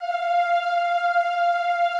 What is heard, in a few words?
EEH F4, Classical Choir; Best Service; Extended Classical Choir